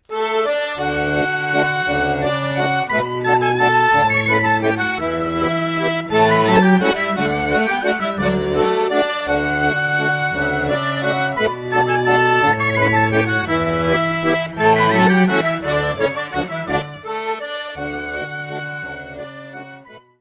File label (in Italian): Vals, Italie; Ters Vals Er Rabat